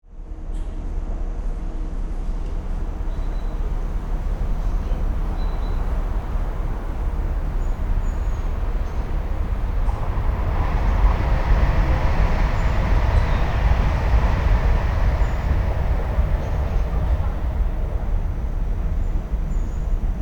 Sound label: Suburban train station train arrival distant perspective